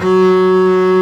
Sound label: F#3 arco bass; Northstar - New Gold VOL-2